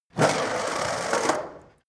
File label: skateboard indoors drive light; sport freizeit